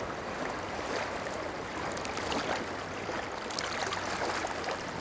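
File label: Ambience Golf Crowd; kinect sports season two xbox 360 gamerip 2011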